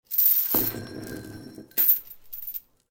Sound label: Footsteps Chain